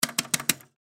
Arcade Game Tap, Arcade Game Tap Button Multiple Hard; Arcades; Technology; Blastwave